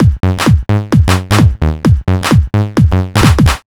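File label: Bass drum Bass, 130bpm, Nu Dance Electro; Zero-G; Soundclash; Combi Loops; Chicago Jock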